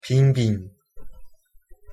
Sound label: ping bing round; Korean; Punjabi